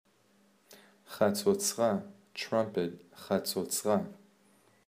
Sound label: Trumpet